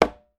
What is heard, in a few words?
quinto, congas; Voice Crystal - Steve Reid Definitive Percussion